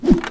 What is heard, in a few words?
Whip Click